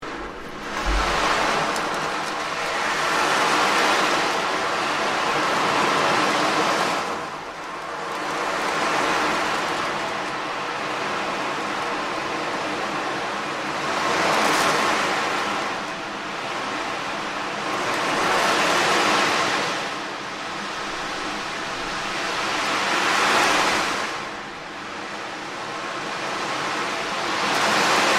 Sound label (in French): tambour de l'ocean 45 cm; Soin Tambour de l'Océan; soin énergétique; Selelight